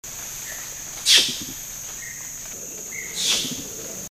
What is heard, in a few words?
Rolling Call